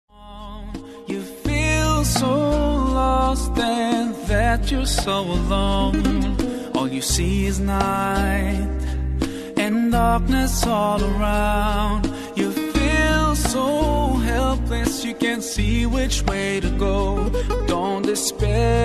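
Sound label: english islamic ringtone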